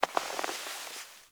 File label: classroom move foley; Ambience; Warframe; TheNewWar; Zariman